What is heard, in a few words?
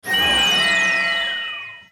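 attack; hero sound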